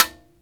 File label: TIMBALES